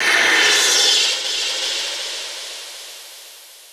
wisp strike; weapons; dota; dark willow

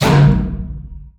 attack; dota; phoenix

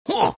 riki pain

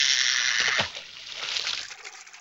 fish cast; dota; crownfall

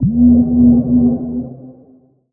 rain cast; weapons; dota; oracle

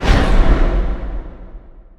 astral imprison cast; dota; obsidian destroyer

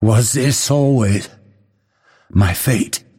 death; dota; phantom assassin

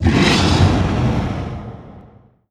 cold embrace cast; winter wyvern

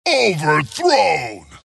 death long; dota; skeleton king

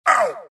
wraith pain; dota; skeleton king

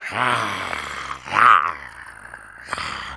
zombie spawn; undying

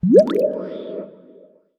frog bubble target; weapons; dota; creep; neutral

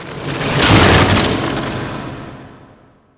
death; dota; crystal maiden

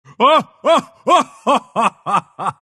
pangolin laugh; dota

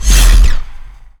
ion shield startup; weapons; dota; dark seer

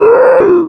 death wildkin; dota; creep deaths

